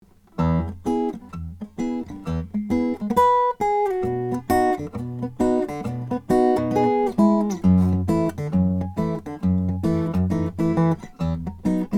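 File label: ribbecke halfling brazilian rosewood; pin bridge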